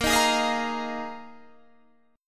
A sharp 7th Suspended 4th A sharp, Guitar, Standard; A#7sus4 strummed